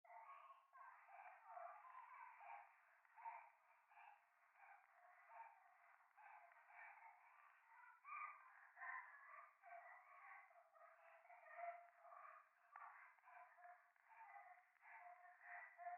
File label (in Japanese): 可愛い 喘ぎ 暖炉; 夢の中